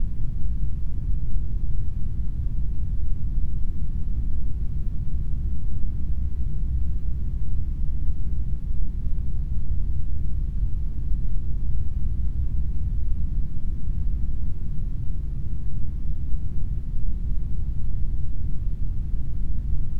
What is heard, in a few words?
dungeon, ambiance